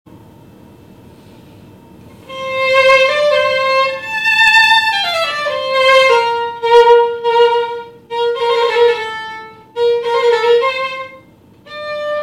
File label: Violin; KMEA Year 4; Mozart; orchestra; audition excerpts